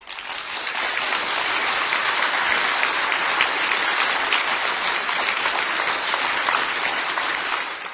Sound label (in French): Applaudissement